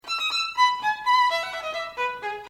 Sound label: violin